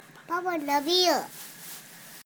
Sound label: beer